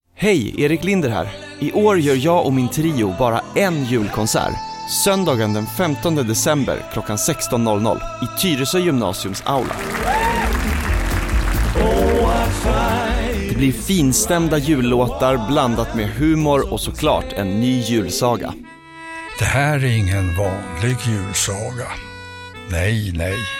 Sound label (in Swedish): Radio Jingel Julkonsert